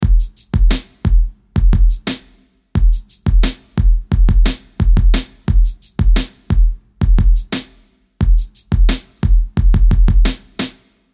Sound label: Groove 4 Bar